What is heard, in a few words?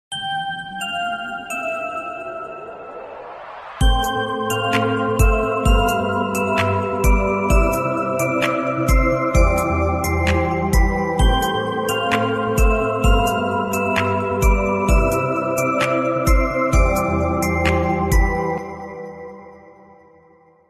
Mobile Ringtone